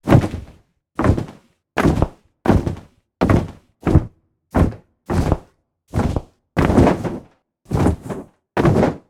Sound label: Dragon Wing Flap